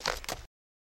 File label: Horse Hoof Step On Gra